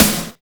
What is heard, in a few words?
HEAVY HS SN, TECHNO; Drums In Your FACE!